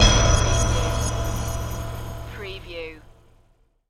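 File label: Production Element Hit Dark; Sound Effects Bible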